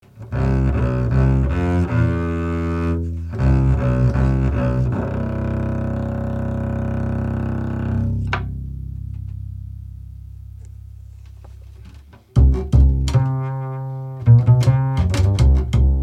upright bass mtp840 dm no 48v; lewitt

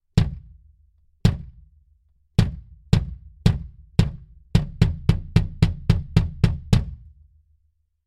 bass drum dtp640 rex condenser dual; lewitt

